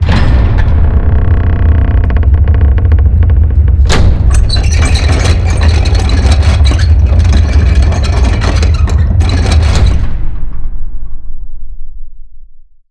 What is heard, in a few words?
Door Act; Seer; GamigoNA